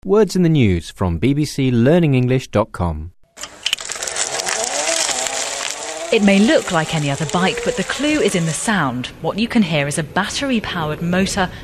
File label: electric bike